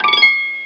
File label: dialog cancel; jam; stereo